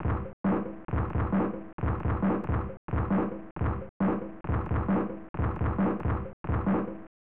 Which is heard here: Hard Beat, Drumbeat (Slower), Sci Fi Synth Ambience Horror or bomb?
Drumbeat (Slower)